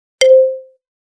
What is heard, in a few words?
click